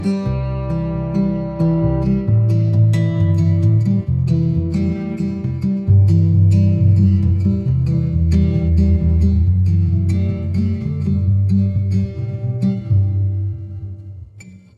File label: ringtone romantic